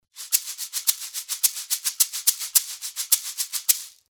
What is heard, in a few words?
chocalo